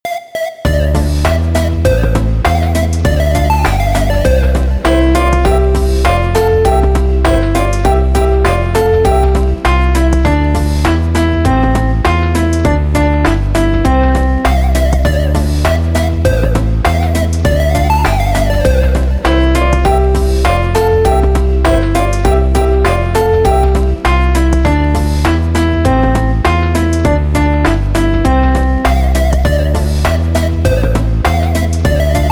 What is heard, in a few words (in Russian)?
fonogramma karaoke